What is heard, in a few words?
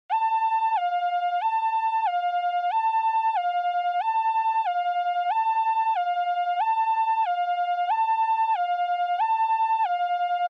Ambulance Siren (Dry)